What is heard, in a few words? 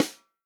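snare drum, Real Drum Kit; POP KIT; Best Service ProSamples vol.43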